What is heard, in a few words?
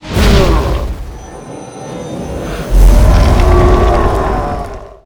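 boss death, monster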